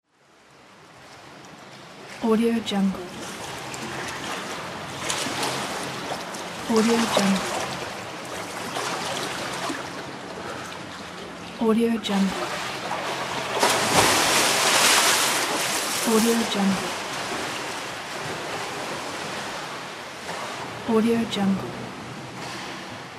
swimming pool; nerso-77

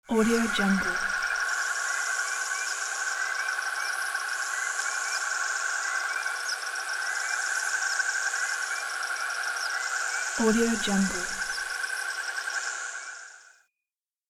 night in forest

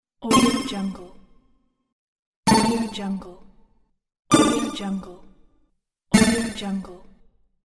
pick coin sounds; dellostudio

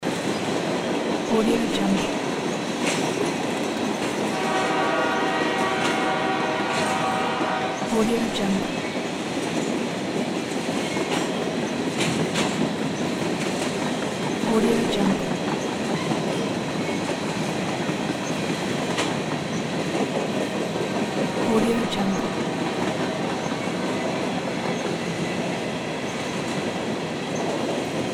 freight train passing loop

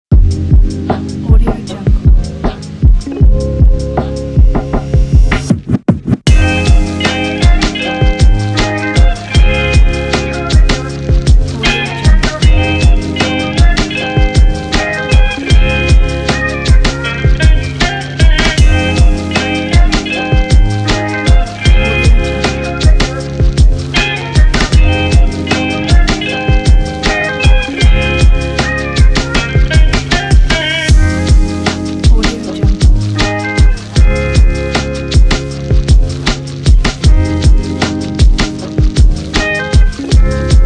lofi chill

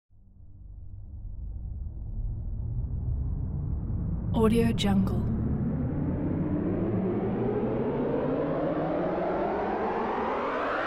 cinematic sound design

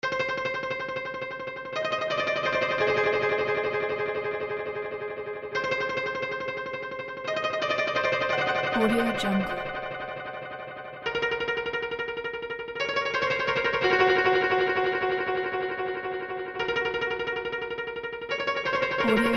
mysterious suspense danger

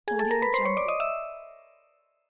increasing bells

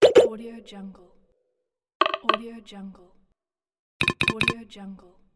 notification

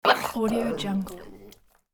pug dog bark

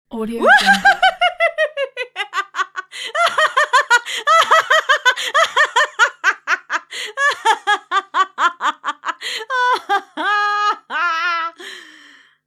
spasmatic female long laughter

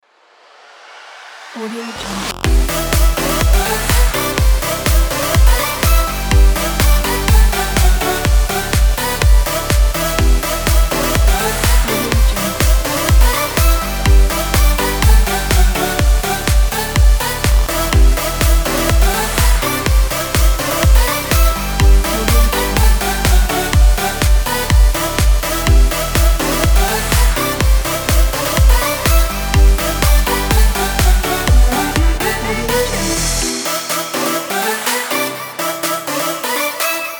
upbeat motivational pop

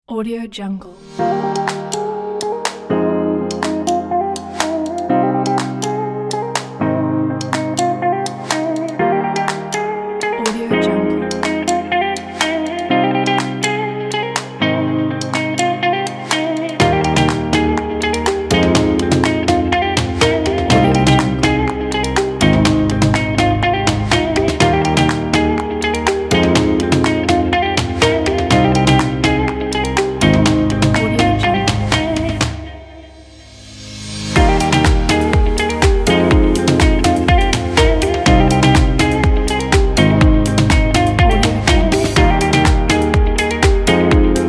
upbeat inspire corporate

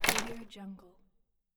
wooden clutter impact

